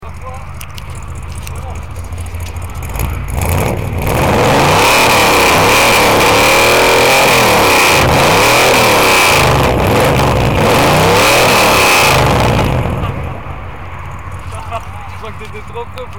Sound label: chevrolet chevelle ss 1967